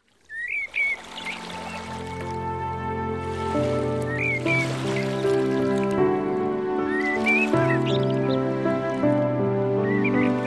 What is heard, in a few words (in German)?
Entspannung